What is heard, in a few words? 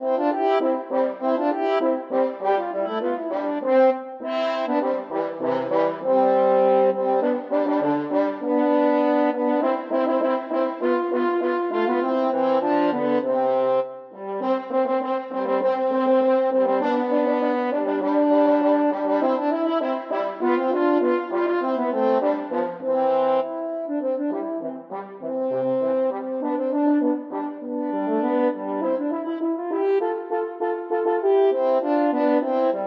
french horn duet